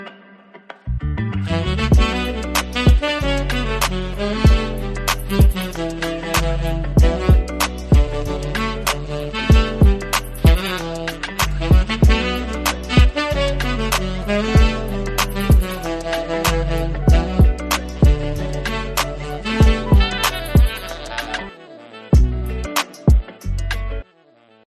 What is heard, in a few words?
chill sax